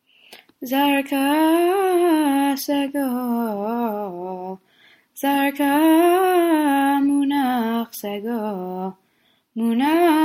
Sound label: polish lithuanian regalim סגול